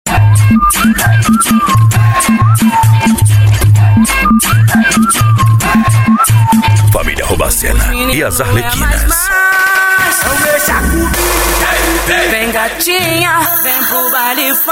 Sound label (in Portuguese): funk bass e sertanejo